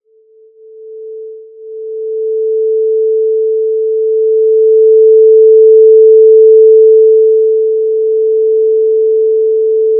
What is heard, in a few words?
noise 440